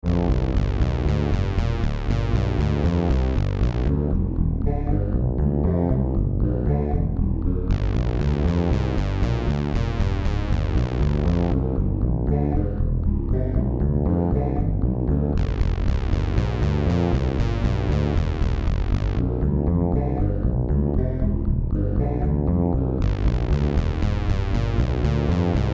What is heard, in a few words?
Trance